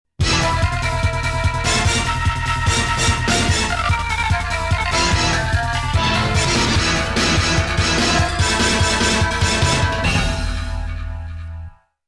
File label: continue game over; saien no shomo; shuen